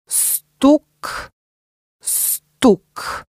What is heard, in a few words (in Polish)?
stuk stuk